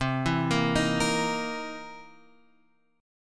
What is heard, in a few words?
C 7th Sharp 9th C Guitar Open F; arpeggiated; JGuitar